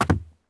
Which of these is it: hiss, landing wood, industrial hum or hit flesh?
landing wood